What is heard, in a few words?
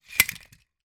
toy cars x2 die cast crash into each other; sound effects one